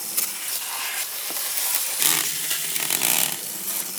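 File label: Slide Loop; moul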